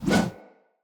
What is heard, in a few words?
One Handed Axe Whoosh; Weapons; Enemy; Axes; Sinecure One Handed Axe